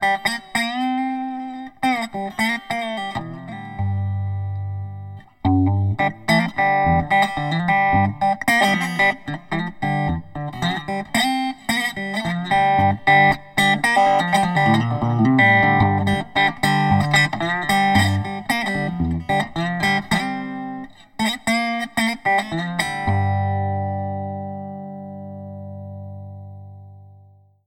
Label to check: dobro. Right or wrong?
Right